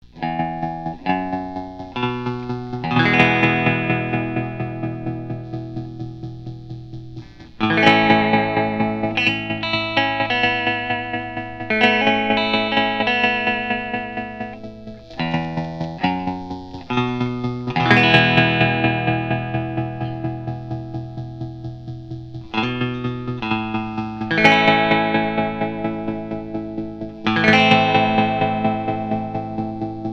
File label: trem o matic w single coil pups; ronsound